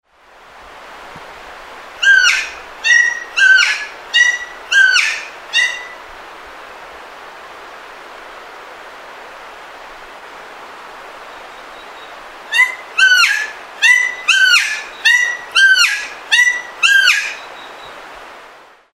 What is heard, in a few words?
new caledonian friarbird